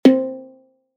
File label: One Shot C Mid Short